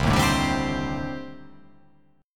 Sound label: C Minor 6th Add 9th C